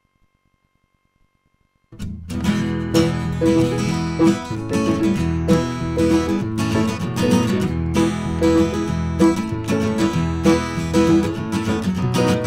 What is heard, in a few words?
Irish Group; fiddle tunes